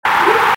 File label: OFFSHORE MAULE CHILE; EQSound